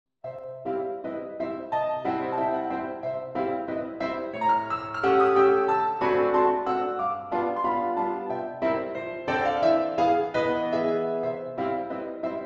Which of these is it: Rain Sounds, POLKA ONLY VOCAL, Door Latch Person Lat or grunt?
POLKA ONLY VOCAL